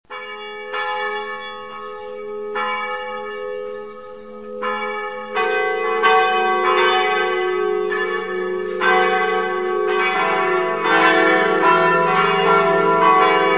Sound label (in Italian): castelnuovo, campane